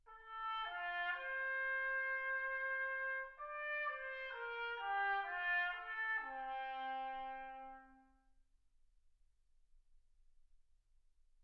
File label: trumpet